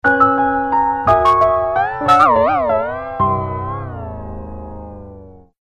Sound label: nokia distort ringtone